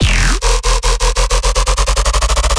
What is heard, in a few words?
bass hit terror hover E; Virtual Riot